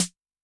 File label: Dance, Yamaha DTXpress-II snare